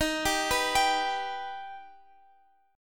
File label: Eb Augmented, Guitar Drop D; x,x,13,12,12,15; down